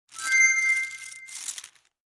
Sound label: lose